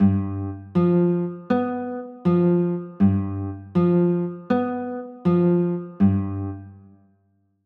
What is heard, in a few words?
g 13, chords; g 7